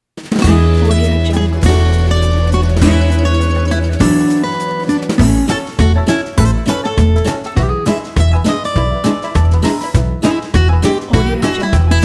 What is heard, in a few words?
jolly retro jazz